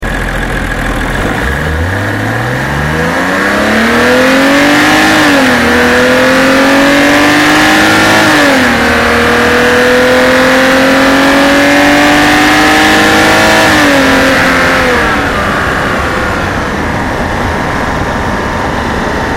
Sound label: F10 523i Acceleration Outside